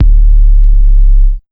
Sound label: Big Beat Drumloops, 138 BD; USB Soundscan vol.25; AKAI; MOVE 138